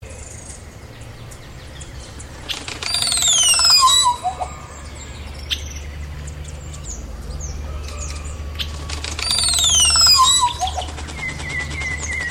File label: Psarocolius decumanus